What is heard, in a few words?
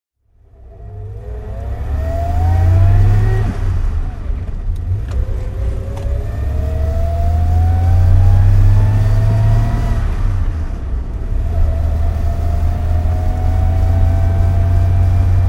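jaguar MkV 35L sal driving, Engine sound driving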